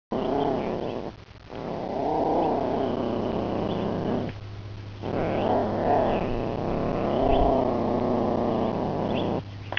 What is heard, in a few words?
rumble, cat; maggie